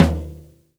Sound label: tom, Drum Kit